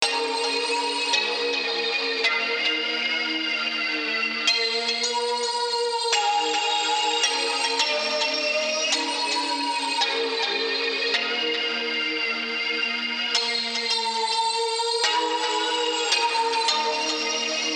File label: Melody Loop Synth Lead G 54 BPM LoFi Ambient